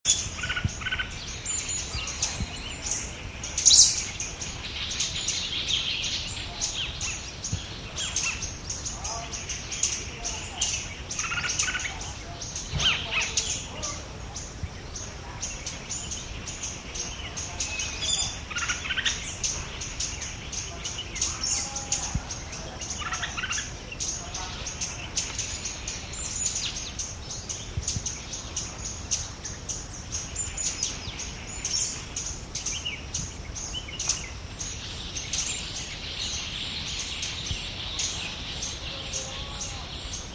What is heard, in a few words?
Morning in the Jungle Camp, India